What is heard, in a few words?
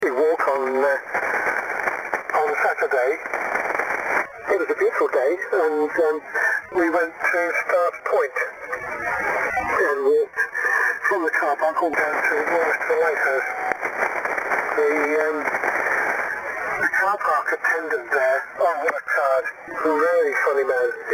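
80 directivity QRN noise reduction east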